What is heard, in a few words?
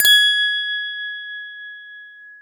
Good idea bell